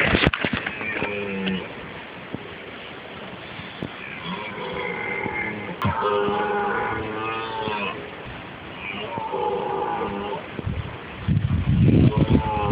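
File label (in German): Hirsche Röhren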